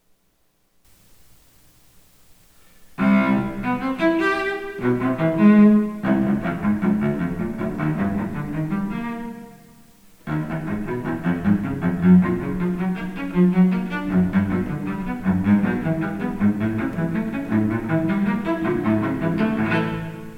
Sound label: Cello; Brahms